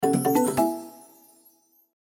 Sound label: win Jackpot Grand; Sugar Town